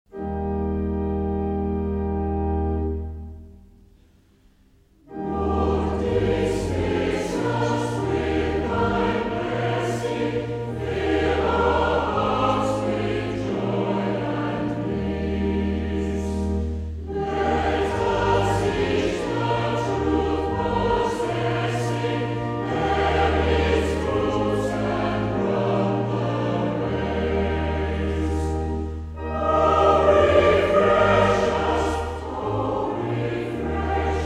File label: lord dismiss us with thy blessing, Northern Choir